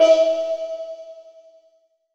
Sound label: CHINESE, LINN DRUMS; EdgeSounds; Drum Mashines VOL-1